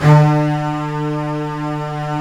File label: STR STRBS, MIDI Section; String Master Series; Combos 2